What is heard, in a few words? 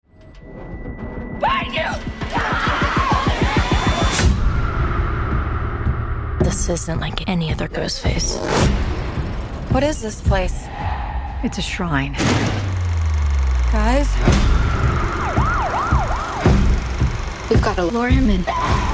scream; Scream VI; TV Spots